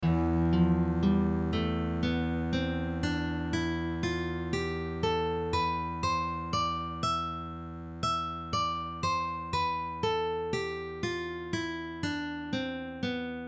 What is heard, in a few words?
E Phrygian; Heroes Of Might And Magic 4